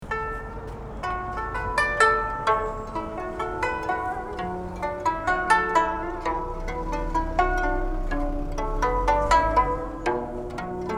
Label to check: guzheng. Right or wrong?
Right